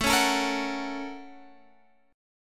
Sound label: A sharp Minor Major 7th Sharp 5th A